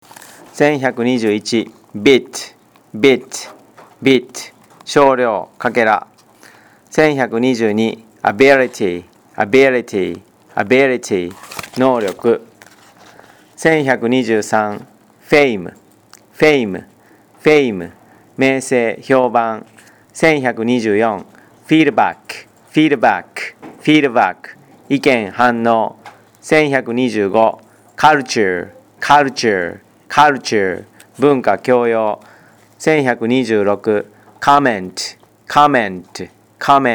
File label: tango